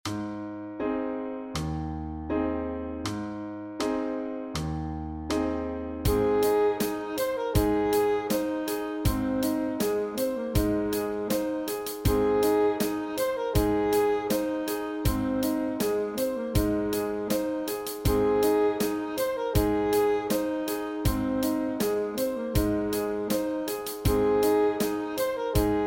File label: Sax Study